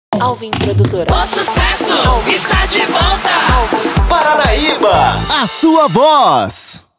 VOLTA BREAK; PACOTE ENTRADA E SAIDA BREAK

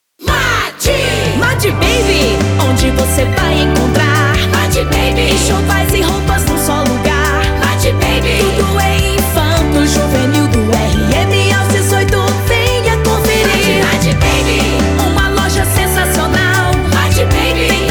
JINGLE COMERCIAL; MADY BABY; ALVIM PRODUTORA

